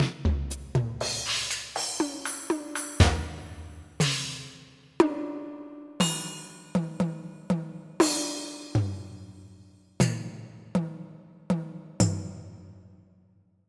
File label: Metl Drums Damp